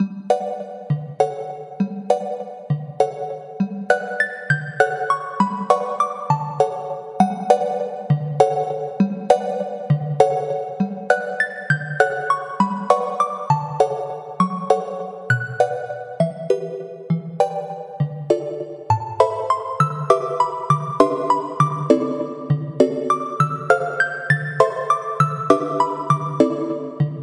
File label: theme music single; tetri5